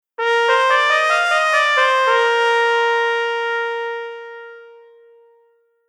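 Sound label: Horn Non Legato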